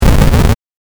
8bit ゴリラ

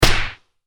ツッコミ打撃

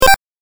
8bit 選択

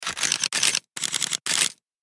カメラシャッター

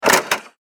中型ロボットの駆動音

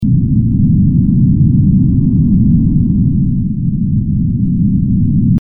地響き ループ

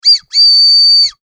フエフキラムネ

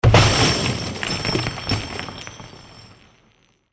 破壊音

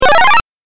8bit 上昇

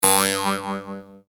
ビヨン

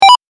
決定

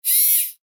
ロボットアーム

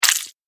クリーチャーが食べる音

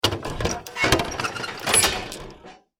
自販機 飲み物出てくる

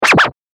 スクラッチ

